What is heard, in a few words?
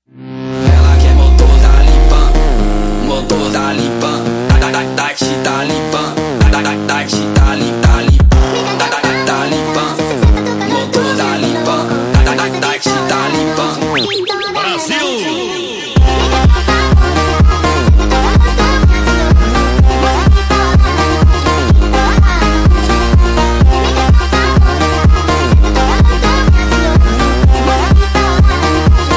BAILE VIBE Speed Up